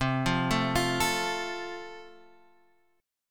F Major, C

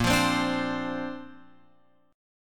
A sharp Major Add 9th, Guitar Open F; strummed

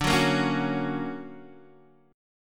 D Augmented Major 7th down Guitar Half Step Down; D+M7

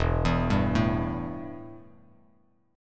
E Minor Double Flat 5th E, Bass Standard; arpeggiated; JGuitar

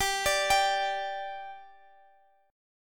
G 5th G Guitar Standard, chord; G5 arpeggiated

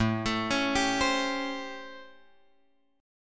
A 7th Sharp 9th; A7#9 Chord {5 X 7 6 8 8} arpeggiated

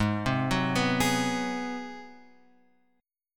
Ab 7th Sharp 9th, Guitar Open F, chord; Ab7#9 arpeggiated; JGuitar

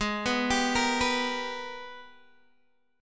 Ab Minor Major 9th, down, Guitar, Open D

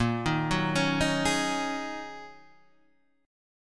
F sharp 6th Add 9th A sharp

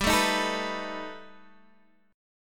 B 7th G; B7/G; strummed

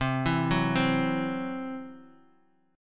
C Major Flat 5th, C, down, Guitar; arpeggiated; CMb5

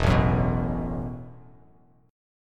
E Suspended 2nd Suspended 4th E, Bass Standard

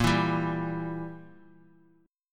E Diminished Bb

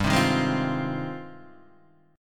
G sharp Major 13th

